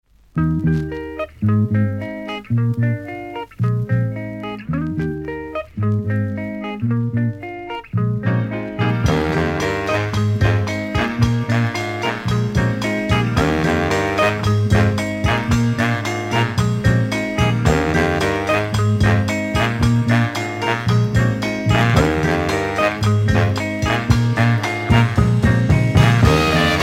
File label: african echoes zulu lunchbag